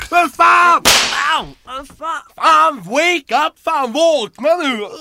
Smack

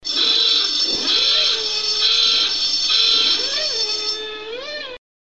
Klaxon

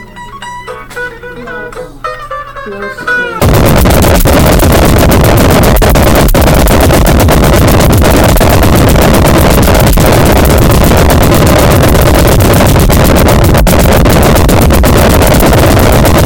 Mic Beat

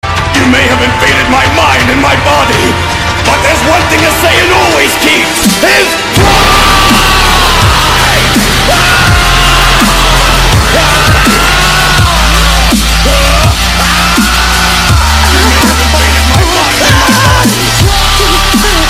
Dubstep; Vegeta

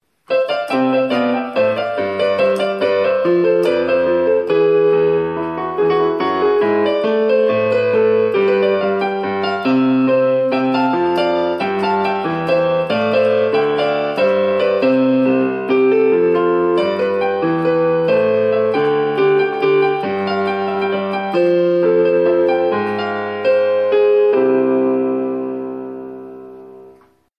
Piano improvisation

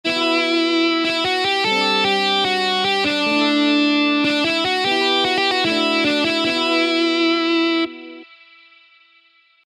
metal riff

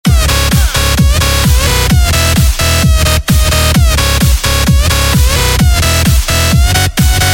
Techno

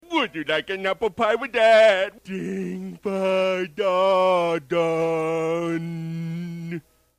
Short Ding

